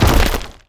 Ravager Jump

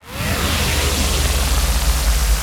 Diffuser Charge Start; Arc Nova

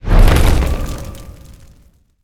Pillar Summon

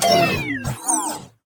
Recharge

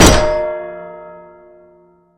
Hammer Big Impact; Fallen Paladins Hammer

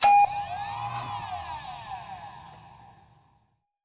Wulfrum Ping

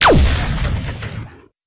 Mech Gauss Rifle

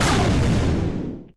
Plasma Shot; Anomalys Nanogun

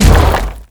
Ravager Stomp

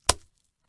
Wet Slap

